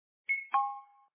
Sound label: ringtone hangup; Tencent; QQNT